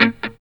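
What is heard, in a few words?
GUITAR STAB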